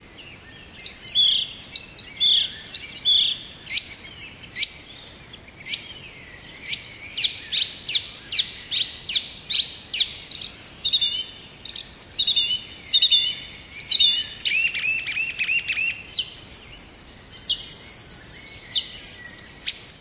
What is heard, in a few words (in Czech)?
Drozd Zpevny; poznvme bory